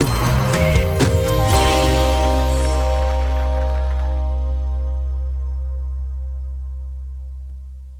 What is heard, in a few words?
Game mode transition